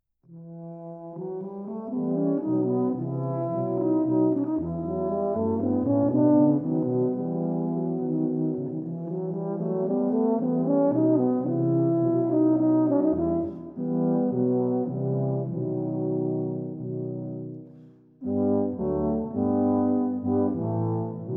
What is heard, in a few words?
Tuba Trio